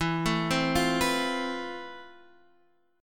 F Major Add Sharp 11th E

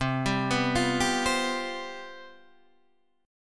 C Major 7th Suspended 4th, down

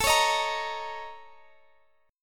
Bb Minor Major 7th Double Flat 5th

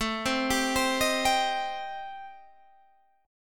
A Minor 7th Flat 5th A, Guitar, Standard; arpeggiated; JGuitar

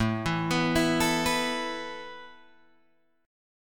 A Suspended 2nd A Guitar Drop D

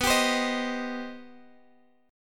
C Minor B; strummed; Cm/B

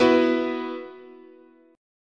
B Suspended 4th, guitar chord

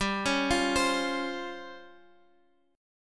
Db Major 7th Flat 5th, G, guitar

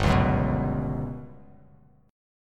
G Major 7th Flat 5th; strummed; JGuitar

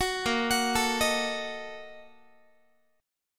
Bb-Major 7th Suspended 4th Sharp 5th, Guitar; arpeggiated

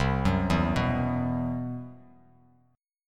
C Major 7th Flat 5th C, Bass Standard; CM7b5 arpeggiated; JGuitar

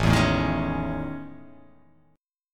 A Major Sharp 11th

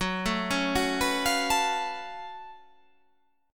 F sharp Minor Major 11th; chordshape; down

